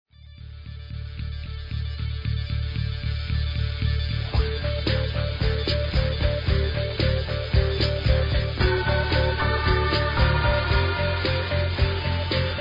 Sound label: bgm